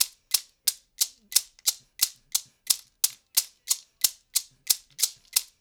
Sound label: LOOP, SHAKERS